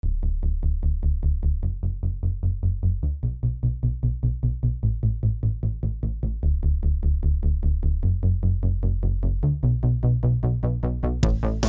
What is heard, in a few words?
synthwave metal; ost